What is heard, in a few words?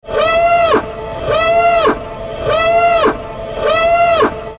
alarm ringtone; star wars